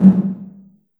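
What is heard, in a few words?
large room 808, reverb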